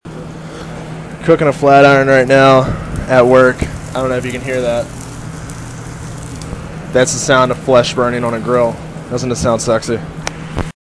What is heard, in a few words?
flat iron